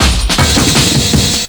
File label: LOOP SD, Drum & Bass, 160 BPM